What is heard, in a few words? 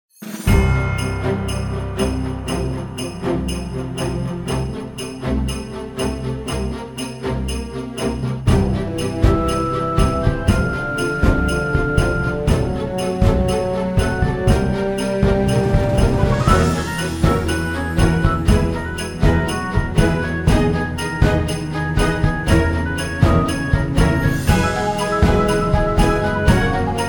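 new year countdown music celebration holiday background intro theme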